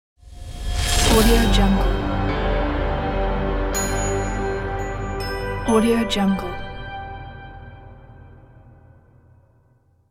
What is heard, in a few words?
modern logo reveal